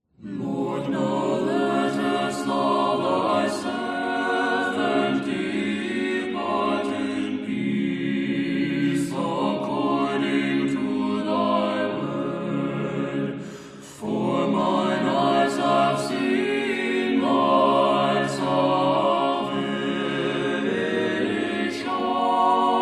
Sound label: Russian 'Greek' Chant, Tone 6; Lord, Now Lettest